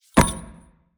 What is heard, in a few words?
morgenstern grab; player